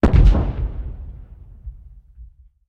tank explosion far; War Thunder; tanks

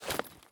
medkit drop; Escape from Tarkov

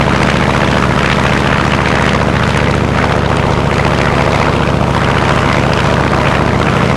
zero rear, engines; War Thunder

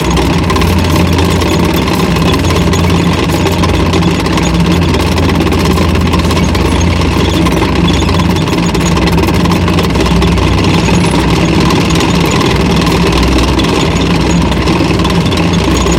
tracks light speed, tanks; War Thunder

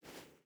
footstep barefoot sand walk; Rust

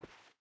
footstep barefoot cloth walk; Rust

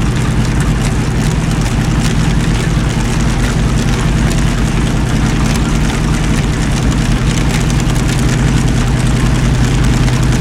panther engine rpm load, engines tanks; War Thunder

